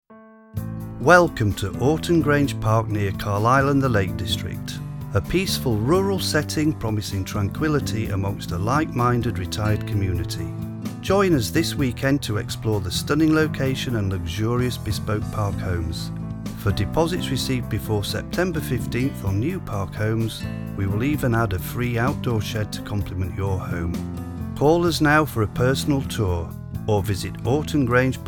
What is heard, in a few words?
With Music; Orton Grange